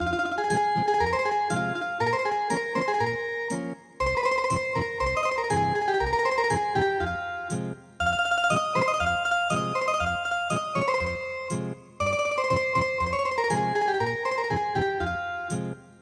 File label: Ethnic Guitar, traditional; The Cart Drivers Song